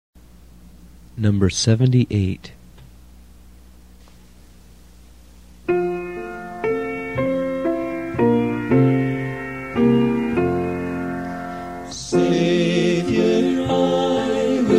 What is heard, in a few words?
hymn; Hymns Old and New; Lead Me On